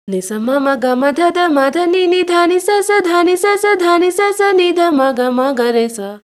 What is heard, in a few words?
stretch; voice; pitchshift; ikm sampletank stretch